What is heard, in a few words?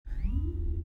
authentication success